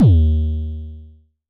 Dist Kicks D#2, Vermona Kick Lancet, Distortion Kicks; LookIMadeAThing Kicks